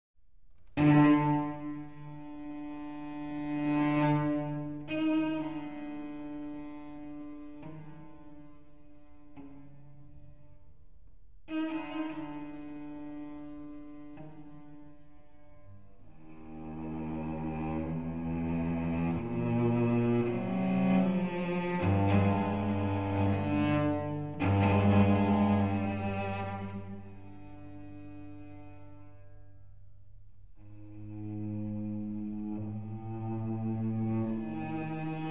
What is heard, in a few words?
String Quartet, Con dolore